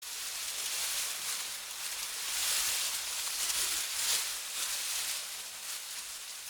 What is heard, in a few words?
scrub